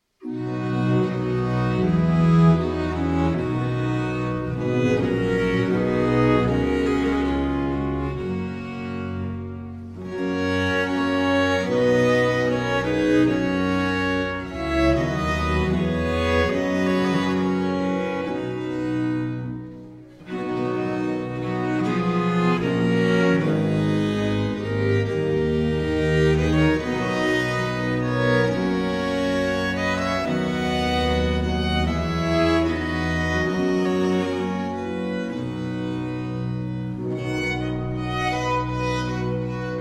sonata in C major; Sonata a8 in C Major

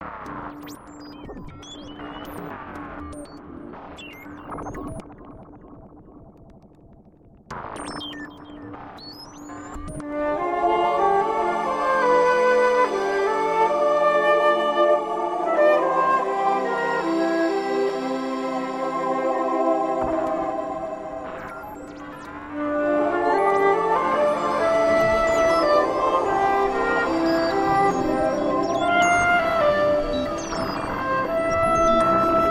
flute and electronics icily; five movements for flute and electronics

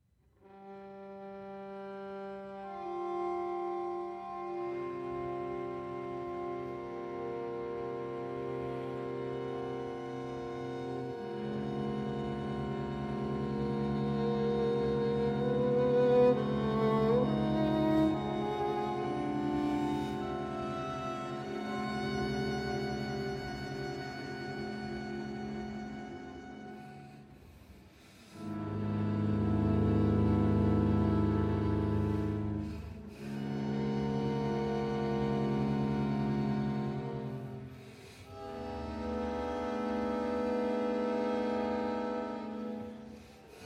string quartet; string quartet no. 2

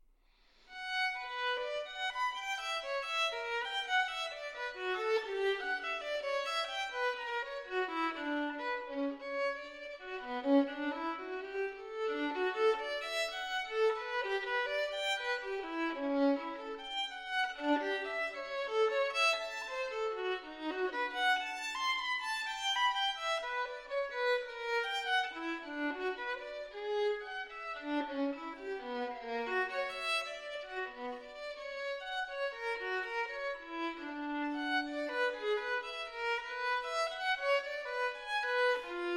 violin partita in b minor double; violin partita no. 1; bwv 1002 ii

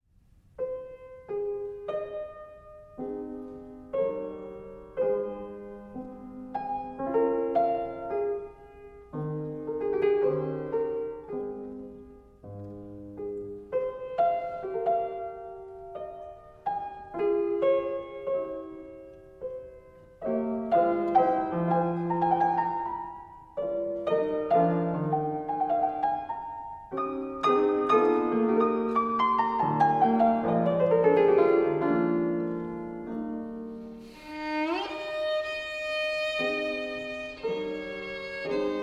sonata in F minor poco adagio

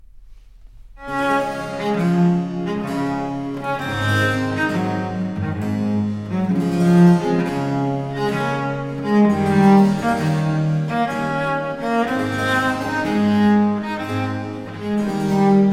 concerto for cello in d major andante e spiritoso

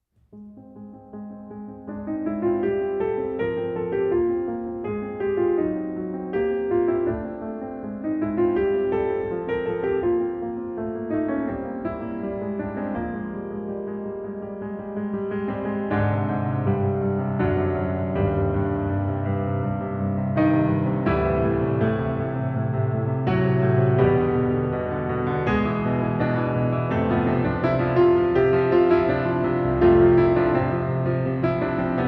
new places andante con moto

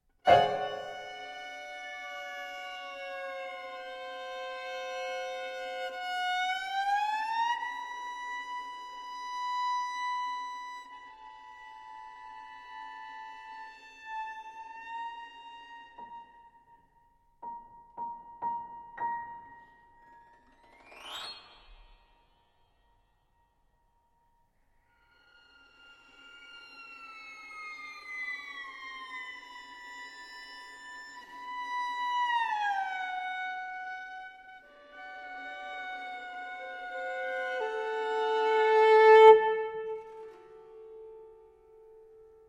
pieces for violin and piano

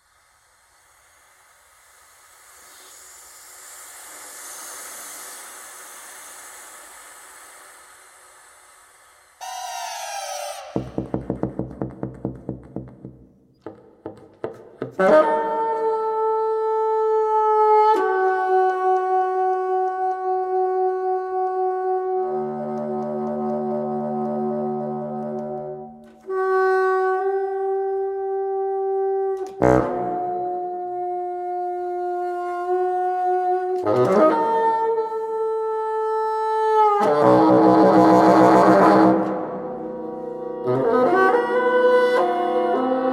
for solo bassoon and 6 pre recorded bassoons

